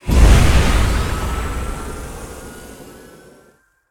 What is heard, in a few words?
super Mega Win Transition; multiplier mayhem